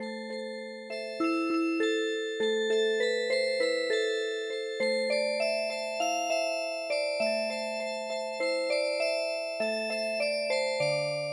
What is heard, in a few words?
Music Box, traditional